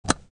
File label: Switch Toggle